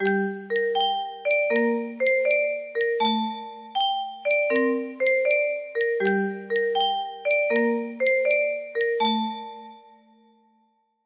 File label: creepy, music box melody